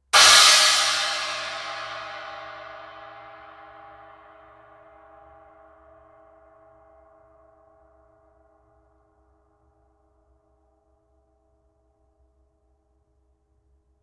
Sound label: 21in Ultra Hammered China, cymbal